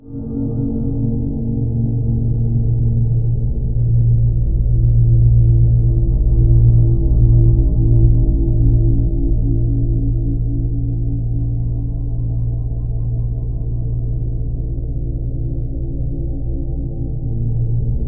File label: TUNNEL, LOW TONES, Ominous Drones; USB Soundscan vol.48; AKAI